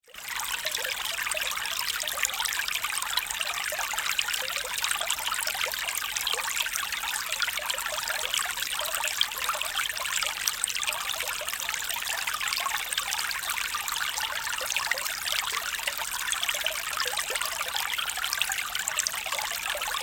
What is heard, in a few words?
sound of a quiet spring stream background sounds of nature; River & Waterfall